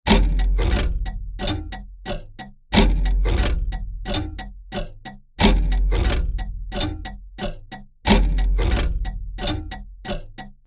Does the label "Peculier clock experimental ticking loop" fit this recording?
Yes